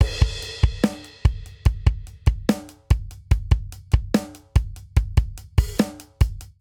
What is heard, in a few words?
Heavy Rock Verse 145 bpm; MIDI Clips; Ableton; Factory Packs; Drum Booth